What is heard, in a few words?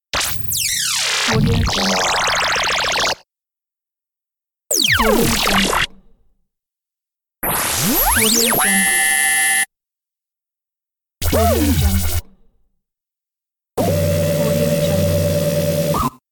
futuro high tech; efxideas